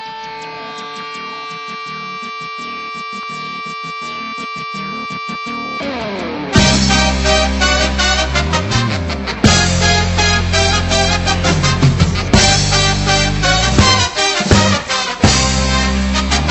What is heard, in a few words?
japanese ska music